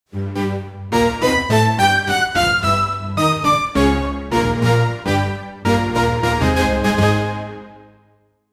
comedy tension sting

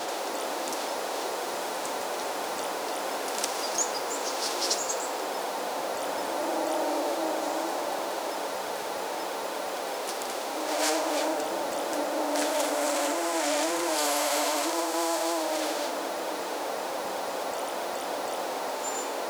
gentle wind breeze soft

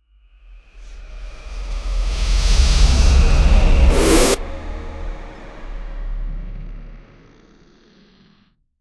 an ancient unknowable sou, divine

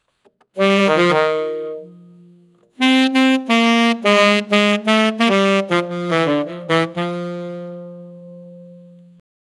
short saxophone riff

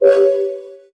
healing health sound game

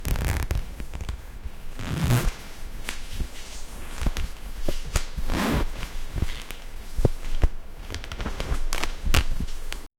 close foley of heavy fabr; realism

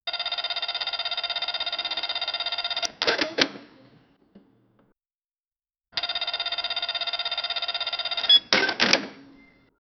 cash register ka ching; tally

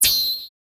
sharp electric zzt tick

